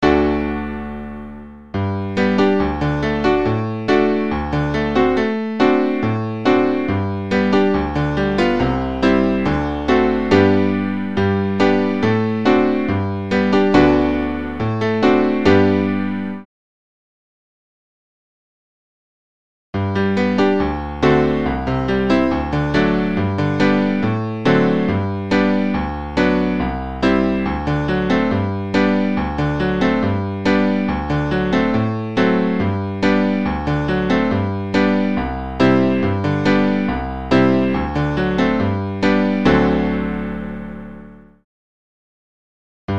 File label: accompaniment only